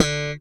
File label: PULL D3, Bass; Sampleheads; Will Lee Bass Library; SPECTOR PULL